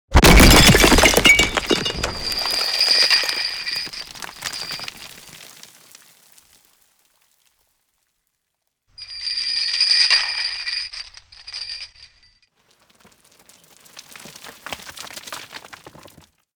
Water Canal

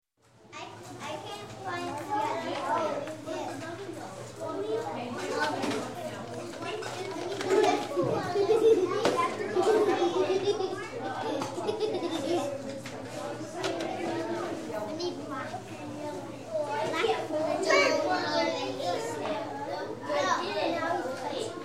Children Nursery School - Playing With Teachers; Ambience; Sound Ideas 2000 Series

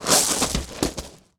Bodyfall Gravel; SFX Kit; Bodyfalls

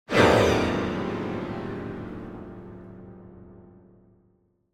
Symphonic Hit Confused Violins - Hit; Hollywood Edge; sound designer's toolkit v2

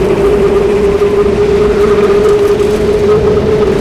Tornado Loop; Destruction

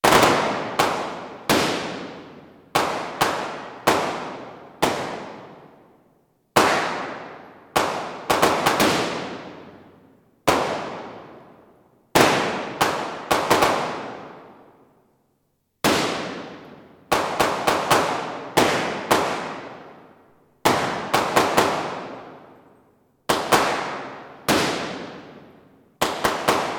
Gun, Range, Indoor, Multiple Hand Guns; gun and weapon sounds; captain audio; fully loaded

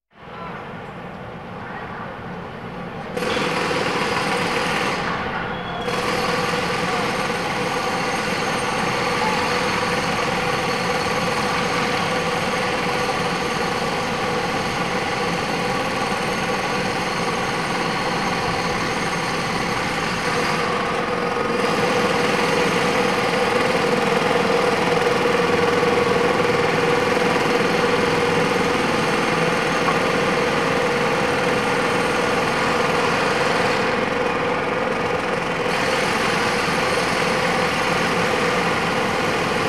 Demolitions City Ambience; KMP Film Video Sound Effects

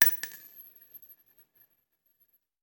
Bullet Shell; Sound Ideas; Guns

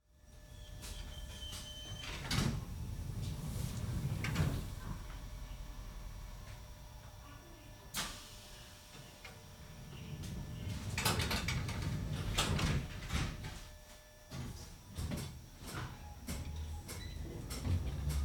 Underground trains ride interior (London); Travel & Transport

